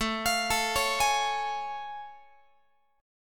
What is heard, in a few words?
A Augmented Major 7th A Guitar; A+M7 arpeggiated; x,17,20,19,17,19